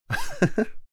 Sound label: Adult man giggle chuckle; laugh